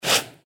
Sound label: player walk sand loop; footsteps